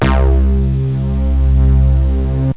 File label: synth bass long; OctaMED SoundStudio V1; jazzies samples